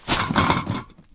trash bag

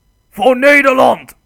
charge

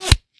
bullet meat, guns hits; Weapons

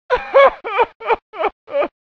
male cry

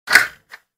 door lock off; civ13; civ14; Machines

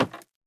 wood, footsteps

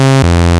buzz two, cargo

